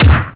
shot

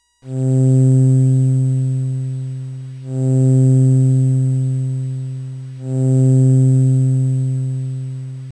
string

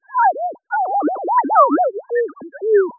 sine

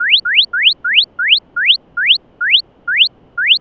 Bird Song synth

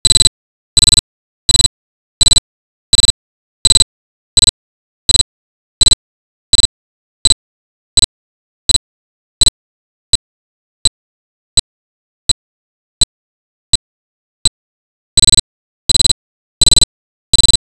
Cricket

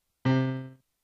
piano

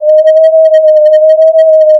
distort; distort samples

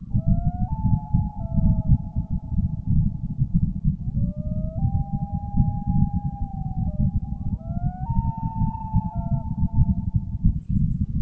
loon noise

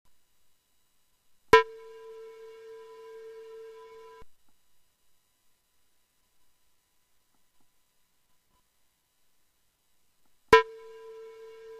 knock a bowl

